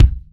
natural kick one shot b key, Royality free kick drum one shot tuned to the B note